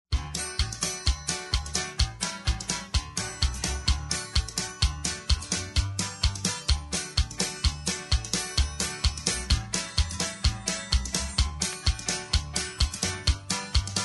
hoedown, square dance music

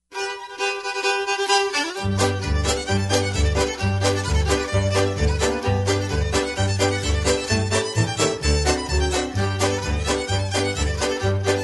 whistling rufus extended, square dance music

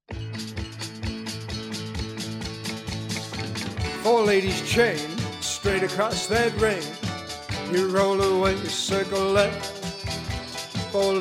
vocal, square dance music

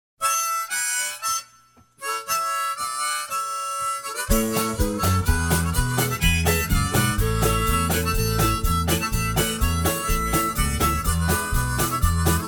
harmonica; square dance music

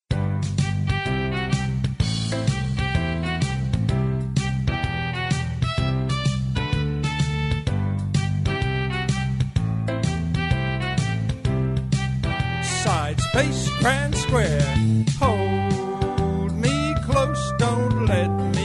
hold me close vocal; square dance music

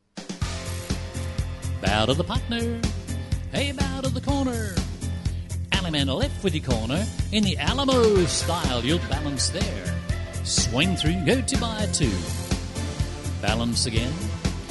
hoedown vocal plus; square dance music

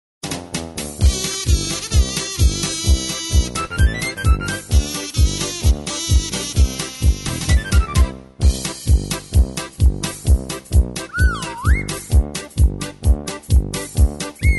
with background vocals, square dance music

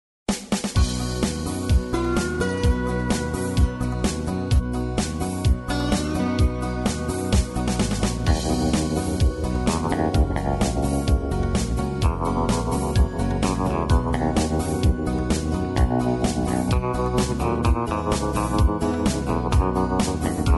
with background vocals, square dance music

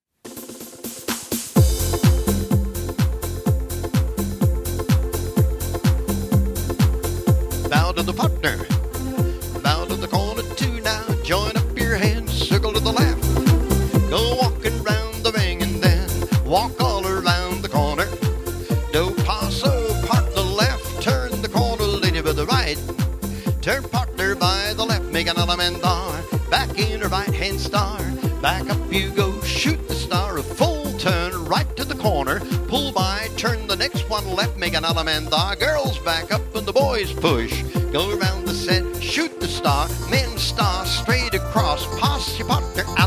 hoedown vocal; b bar h records; square dance music

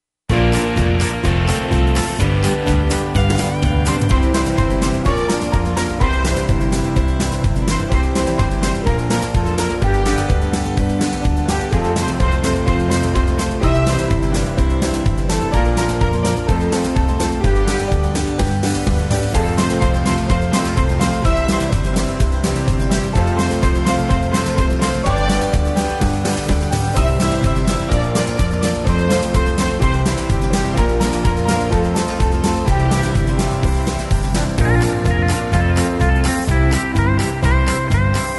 hoedown, Instrumental TRacks, square dance music

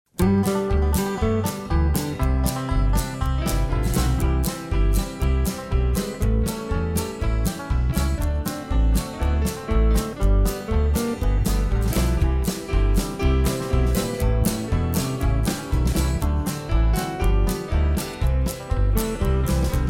country honey, square dance music

